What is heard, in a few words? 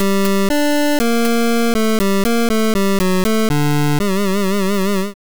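jingle classic bach g minor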